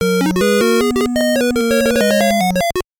Level End

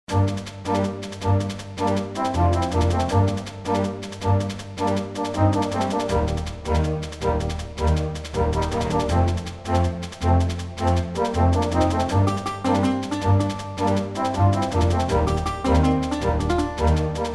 Battle Intro; Swords and Sandals Medieval; Swords and Sandals Official Soundtrack

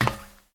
Step Metal; Still Life; windows

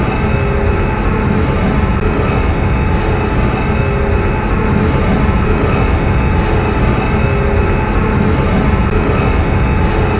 Machine Idle End; Lucius III

